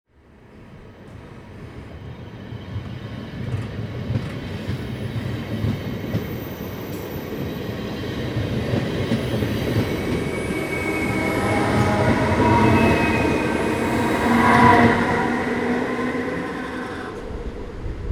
Metro station corridor approaching train and leaving